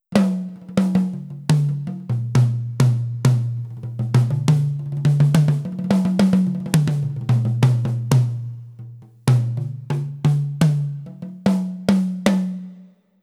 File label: dry toms; professionally recorded acoustic drums